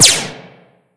gauss

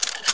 m4a1 mag out

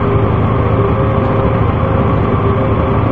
fan large

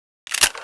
saw close, M249

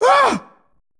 pain; uub

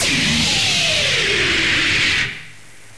eyebeast attack

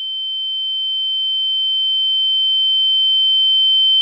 earring; flowoftime

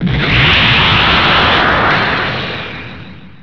death ball fire, weapons

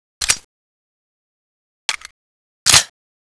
type68 reload empty, weapons

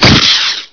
gauss fire

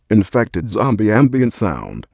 shh

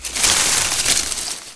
bush

